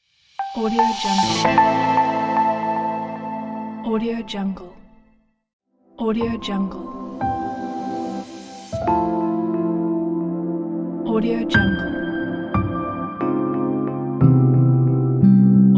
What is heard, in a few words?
documentary ambient heavenly logo pack